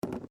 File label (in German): Tupperbox